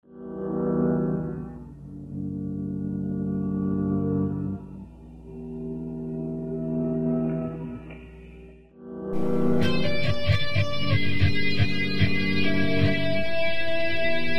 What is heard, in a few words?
midnight mass with electric guitar